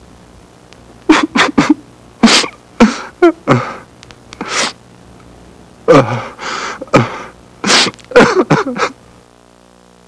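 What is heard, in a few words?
crying; People; Sound Library 2000; Wayzata Technology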